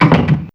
Door, Fairlight CMI IIe

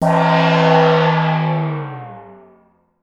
WATER GON, GONGS; Voice Crystal - Steve Reid Definitive Percussion

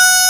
HARMONICA F#4; WIND; AKAI S-Series CD-ROM Sound Library VOL-2

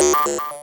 MOOG HAT; AMG - Vince Clarke Lucky Bastard

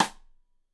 Side Stick Stereo; Deeply Sampled Drums

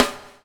BUBL, BUBBLE; Spectrasonics - Backbeat

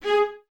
Violas G#4, String Ensembles; Miroslav Vitous; LINKS ORCH

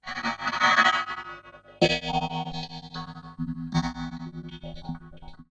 Ring Mod, Cymbal, contact mic; Murf & Ring Mod

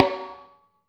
LOFI SNR; Spectrasonics; Burning Grooves

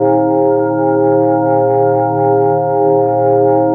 A#3, TURBOBELLS; Northstar; The Wizard VOL-1

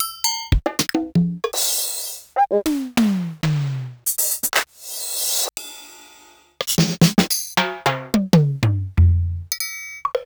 Yamaha EMR-1

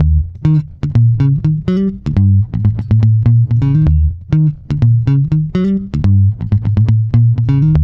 boogaloo D#, bass; Spectrasonics; Bass Legends

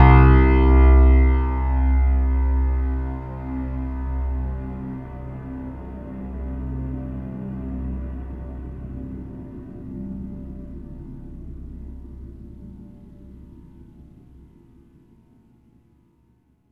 PIANOMORPHOU; MELODICS

